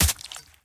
hit; Blind Gladiator

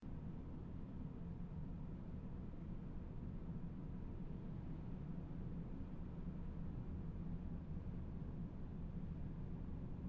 Hallway Roomtone; blindside

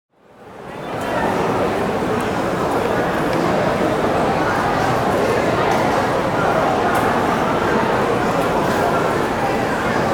Airport Very Busy Crowd, Walla; Sound Ideas; Walla Series

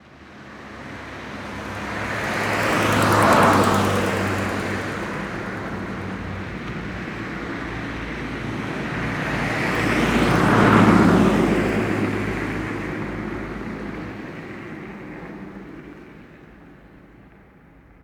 city noise intersection, street traffic; best service Blue Box

